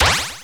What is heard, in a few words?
menu Enter; galaxy invaders